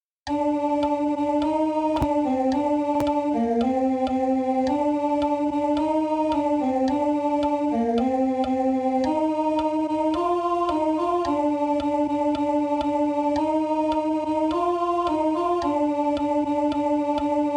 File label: CONT